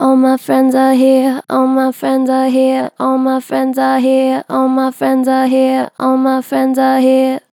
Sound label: Vib All my friends are here, Harmor Vocals, Natural Vibrato Gentle